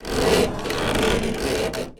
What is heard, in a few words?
plane metall stress; War Thunder